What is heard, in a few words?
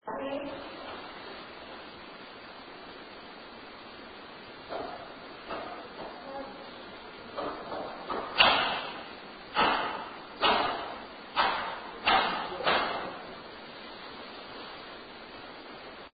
museum noise knocking very loud clear